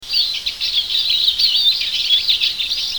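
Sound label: Eurasian siskin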